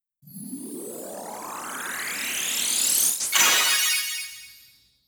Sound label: Limit Break Default; D4DJ Groovy Mix; Android, iOS, Mobile